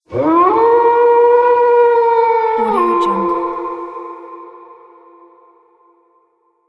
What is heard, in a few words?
wolf howling